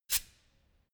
pneumatic pump short burst soft

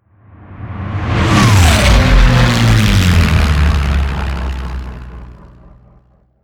flyby

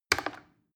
plastic button little click regular fast

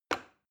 plastic button start release heavy

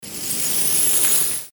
iron chain

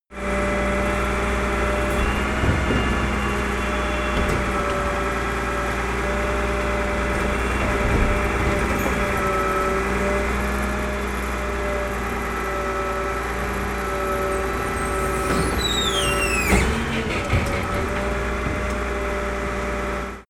garbage collection

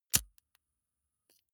lighter wheel switch on flame

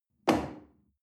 chair wood place soft

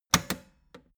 old button hard snap push

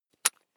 electric drill bit loosen

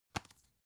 dvd case close

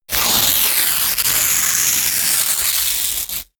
tear

